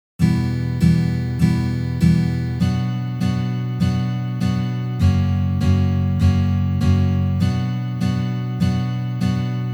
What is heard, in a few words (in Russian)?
Uprazhneniya dlya igry akkorda Mi minor na gitare